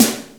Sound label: snare, hip kits